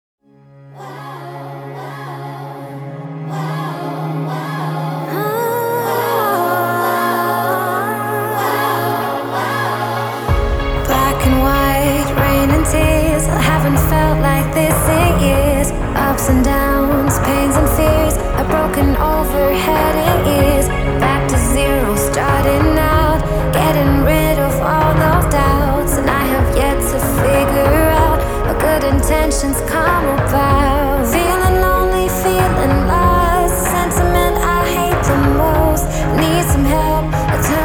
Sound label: pop radio